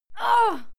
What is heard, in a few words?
female fight scream pain; schreie; menschen